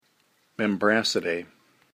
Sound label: Membracidae